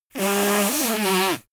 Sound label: nose blow; comedy cartoon; koerpergeraeusche